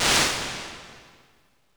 Evolving Room, Impulse Responses, Large Spaces, Rooms; Apple